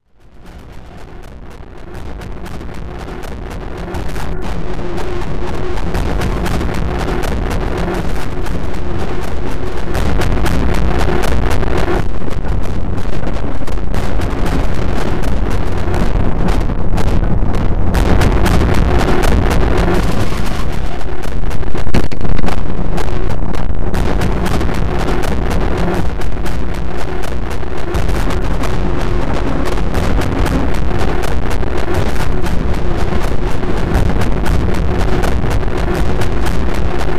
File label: Noise Beat